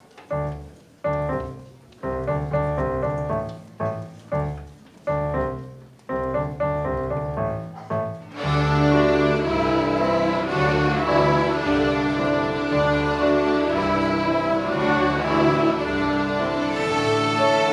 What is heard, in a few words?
rock along